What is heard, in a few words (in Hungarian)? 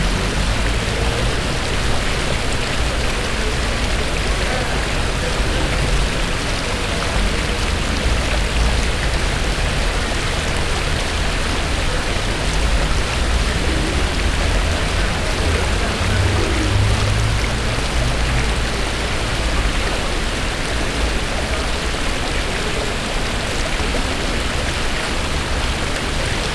vízben gazdag közpark